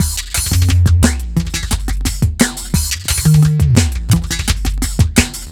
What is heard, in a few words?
Break Beat, Drum N Bass